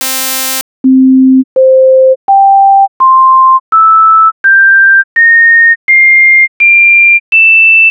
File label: Synth C4 10 partials; FIR